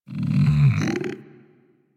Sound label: Interact Affection Closed Mouth Short, Kubrow; Warframe; Tame